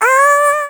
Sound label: Radar Appear Sound; Jolly Battle; Android